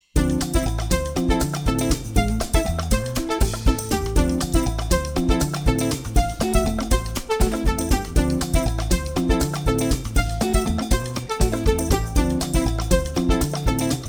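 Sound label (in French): fusion électronique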